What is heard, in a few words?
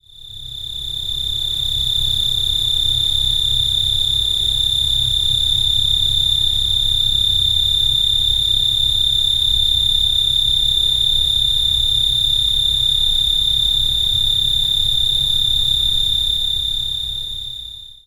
chirrrrr